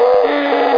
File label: Doom 2 Explosion